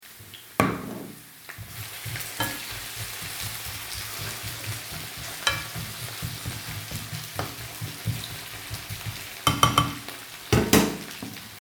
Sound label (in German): Bratpfanne umruehren